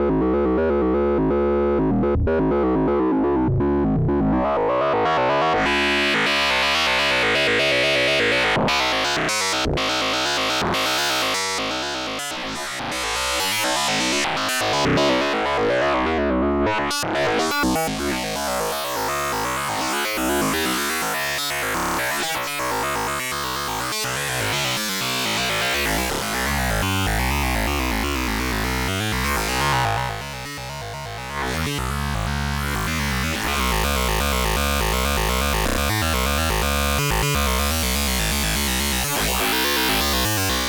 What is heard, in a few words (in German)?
Wavefolder Vergleich Alle 3 zusammen